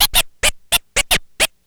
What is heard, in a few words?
Scratching, Vinyl Scratches